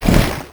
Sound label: tarmonster hit; Crypt of the NecroDancer